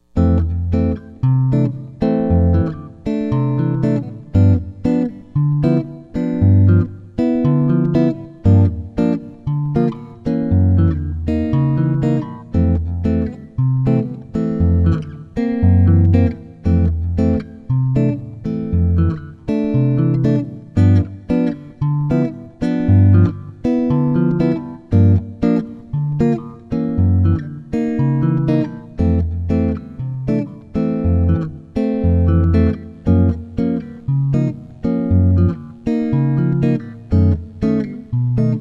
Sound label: fingerstyle; jazz tunes